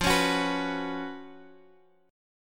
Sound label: Gb Major 7th Suspended 4th F